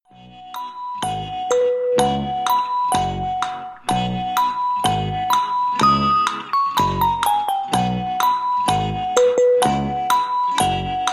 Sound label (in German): klingelton wartemusik jingle melodie; jeopardy